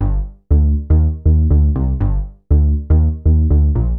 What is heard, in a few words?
BASS, DANCE; MAGIX